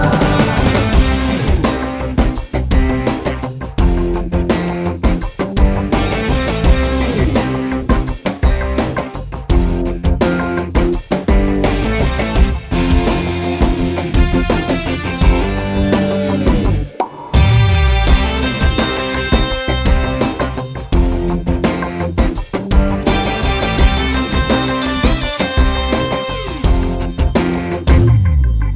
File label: Music Ringtone; Eega; Telugu